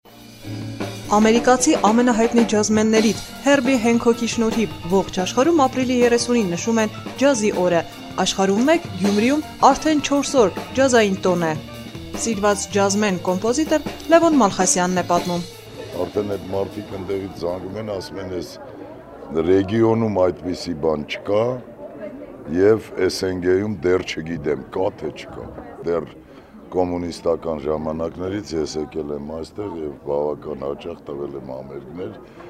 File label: Jazz